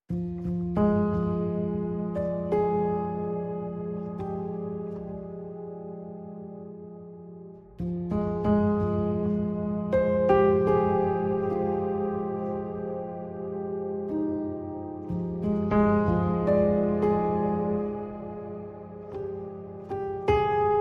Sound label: Pianos Only